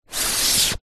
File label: leisure inflated pool toy rubber slide on wall